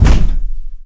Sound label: giant afro kick; Kicks; single hits